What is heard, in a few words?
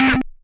nasty stormfront, Electro FX